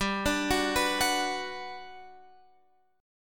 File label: G Major 7th, Guitar, Open F; Listen to GM7 arpeggiated; GM7 Chord